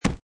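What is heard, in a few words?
Impact Body Kick, Fight, impact, kick, body hit; Punch And Hit Sound Effects; Lynne Music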